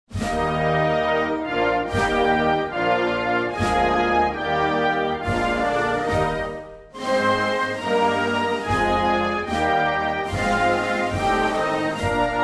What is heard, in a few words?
Anthem Deu; car simulator 2